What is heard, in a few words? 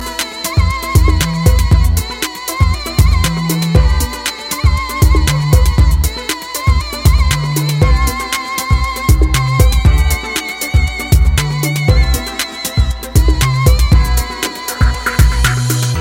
Violin Techno Tone